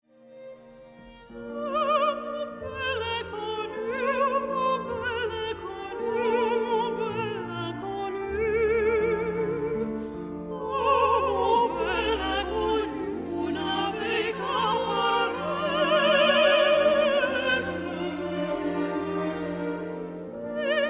Trio

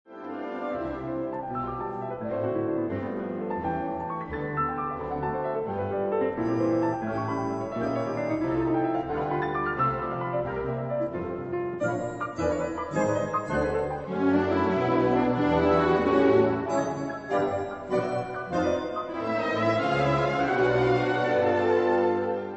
piano Danse; Concertante

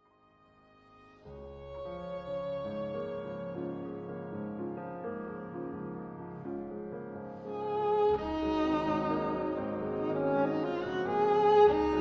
Sonate violon piano; Sonate, violon et piano; 3. Modéré, très à l'aise, au gré de l'interprète

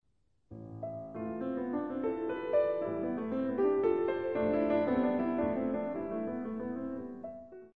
berceuse, piano 4 mains

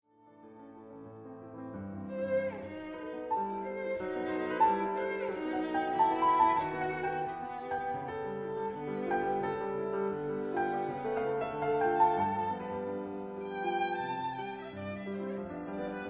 Romance violon piano

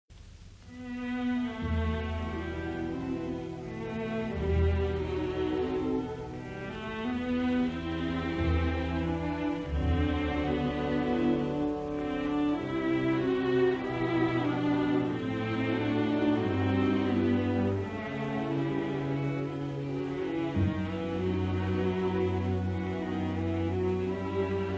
piano Reverie; Concertante

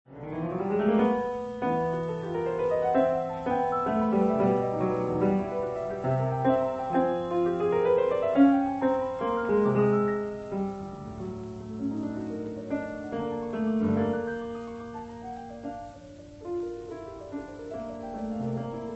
piano Improvisation; Concertante

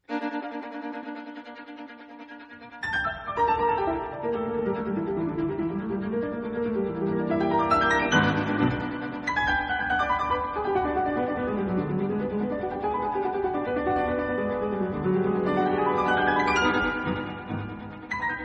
Sonate violon piano

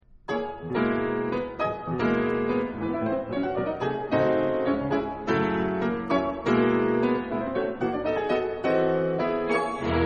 piano Toccata; Concertante

